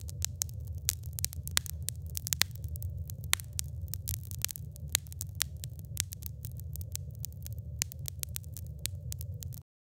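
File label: pleasant crackling fireplace, warm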